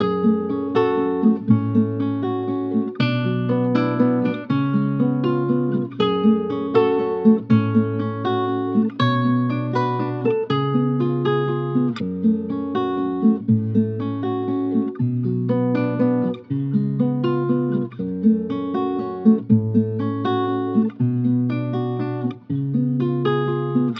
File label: Journey Guitar Loops, 80 BPM F# Min; Valencia; Cymatics